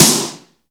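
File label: EFEX snare drum, FX DRUM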